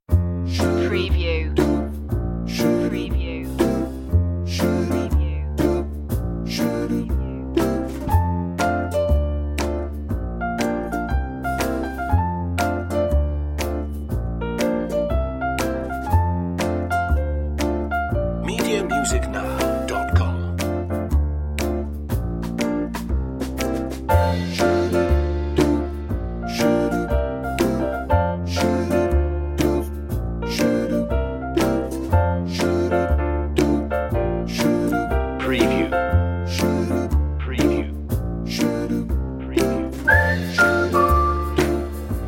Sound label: fresh and fun without improv part